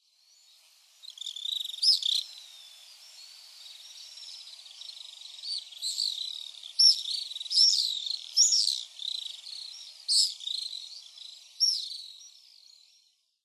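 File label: amatsubame, Pacific Swift; muroran; koe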